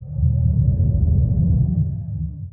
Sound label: Missile Launch